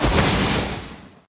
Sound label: Particle Explosion